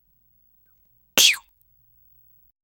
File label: kiss on forehead